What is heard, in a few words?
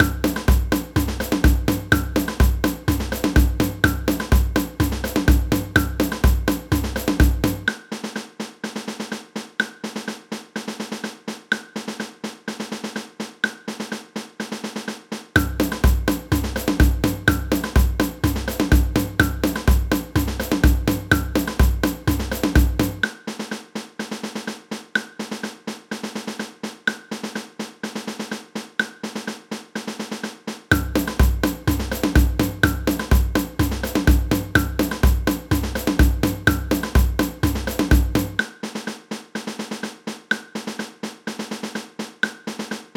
caixa comp, samba